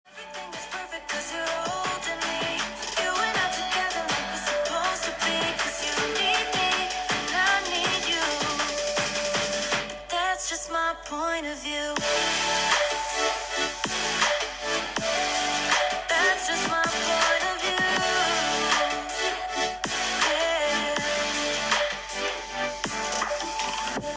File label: iPhone 13 Pro Max Speaker